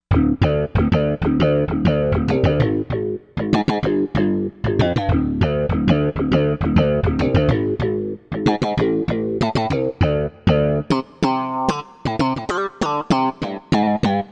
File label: Mumble Bass, Korg MSC-07S Synth